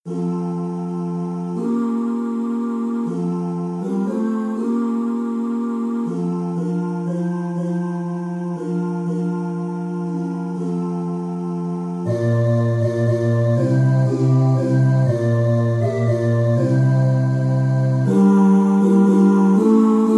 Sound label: Bass